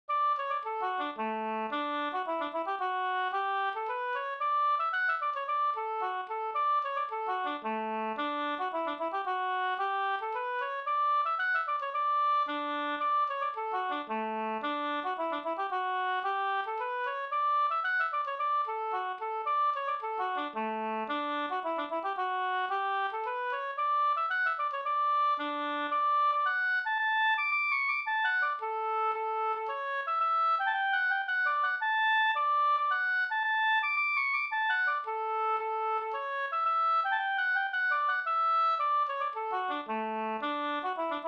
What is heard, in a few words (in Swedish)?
slängpolska